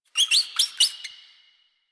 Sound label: Exterior Forest Critter